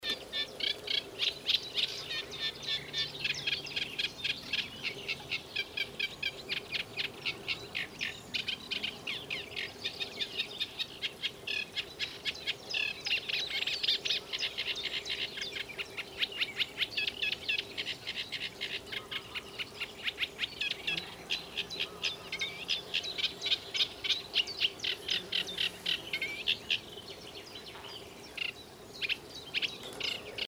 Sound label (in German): teichrohrsaenger